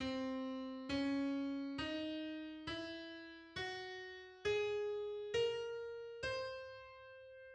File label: Altered scale on C